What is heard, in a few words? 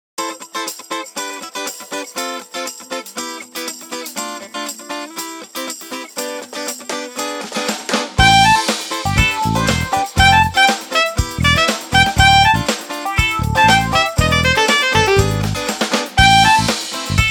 Shake Melody, ringtones; Coolpad; ivvi-K1-mini